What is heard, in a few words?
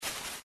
leaves rustling sound effect